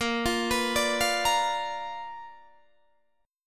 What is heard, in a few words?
B Minor Major 7th Flat 5th A sharp